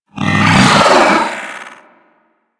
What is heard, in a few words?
Pet Vocal Pain